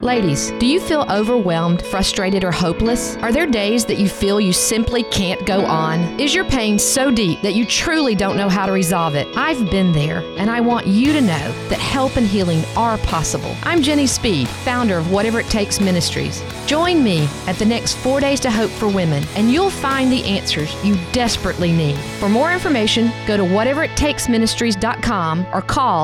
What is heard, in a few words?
music